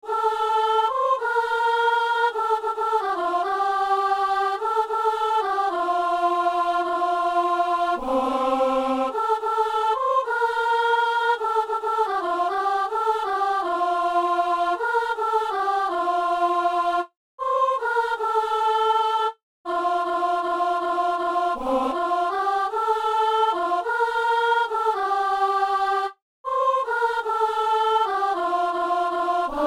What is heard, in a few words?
soprano